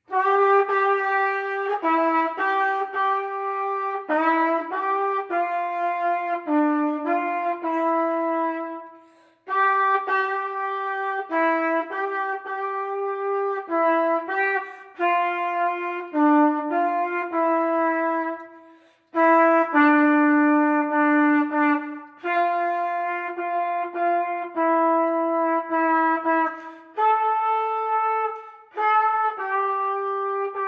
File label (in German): Trompete; Ihr Kinderlein kommet